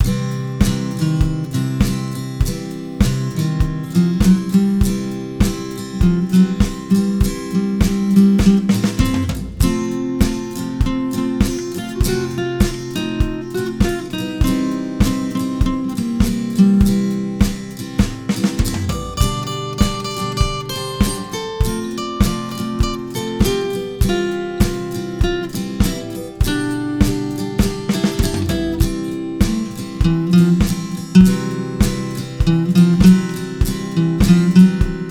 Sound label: Twelve Bar Blues